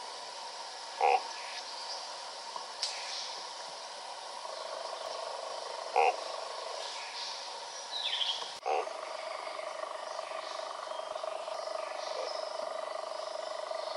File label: philoria kundagungan; Red and Yellow Mountain Frog